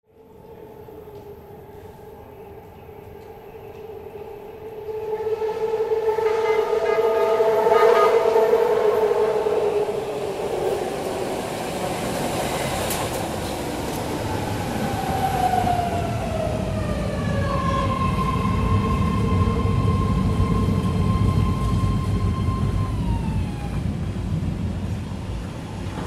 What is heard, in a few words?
metro station, ambience